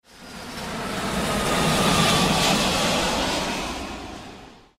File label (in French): avion